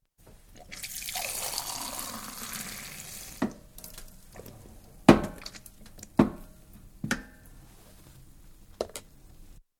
Public House, half a pint of beer pulled; Restaurants; Restaurant Sound Effects; BBC

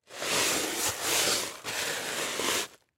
Rock Salt Sweep, Rock Salt Sweep Up Wood Movement; Foley; Rock Sound Effects; Materials; Blastwave

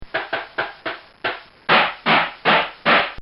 Cartoon Sound Effects Bangs

